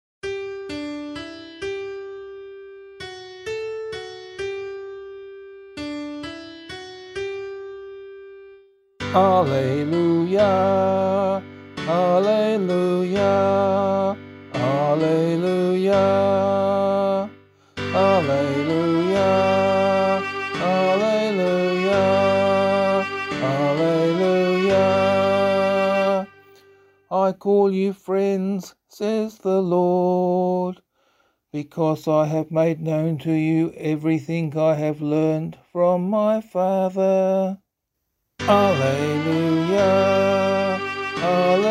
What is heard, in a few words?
Gospel, vocal; Gospel Acclamation for Australian Catholic liturgy